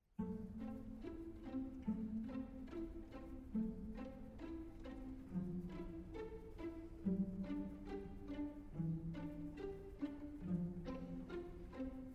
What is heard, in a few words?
piano; level 2